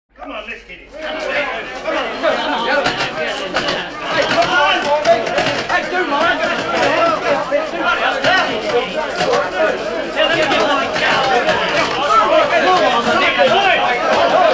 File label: Crowd Of Angry Men Breaking Into A Building. Exterior; upset; Exterior Large Crowd Booing And Becoming Hostile